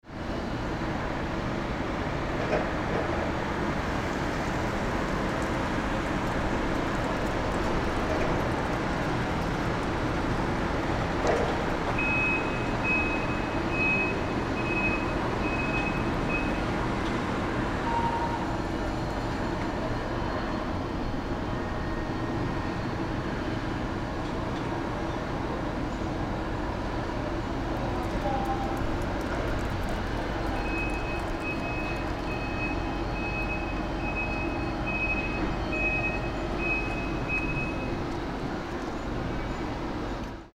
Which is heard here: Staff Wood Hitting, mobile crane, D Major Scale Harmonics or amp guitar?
mobile crane